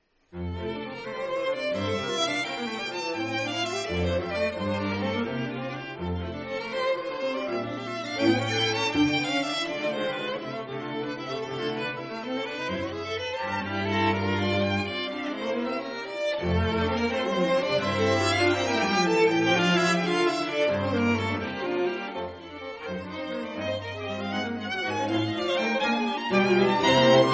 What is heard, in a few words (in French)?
Quatuor